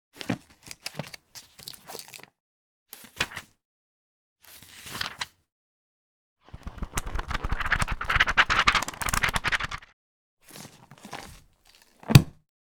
am thanh tieng lat trang sach vo

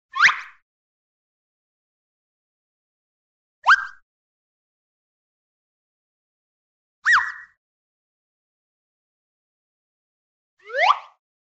am thanh chuyen canh hoat hinh vui nhon

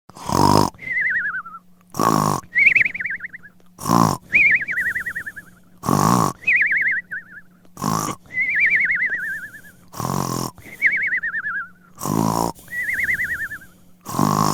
tiếng ngủ ngáy phim hoạt hình vui nhộn hài hước

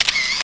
am thanh tieng man trap may anh

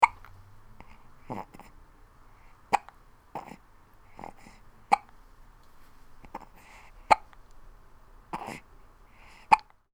hieu ung am thanh tieng nac cut

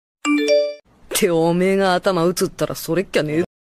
am thanh thong bao; tham tu conan

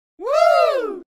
am thanh wow

